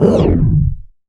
IMP WHOOSH

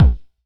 Hammer Hyper Punch, Kicks; Airwindows

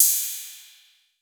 808, CYMBAL; CYMBAL P2

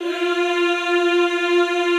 F3, Classical Choir; Best Service; Extended Classical Choir

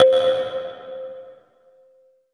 KALIMBA; East West - Phat & Phunky

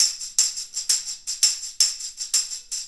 Tambourine

